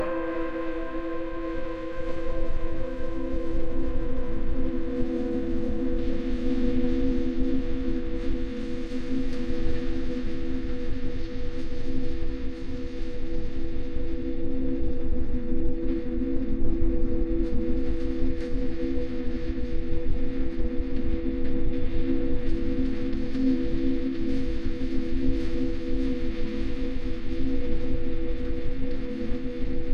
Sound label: ambient meditation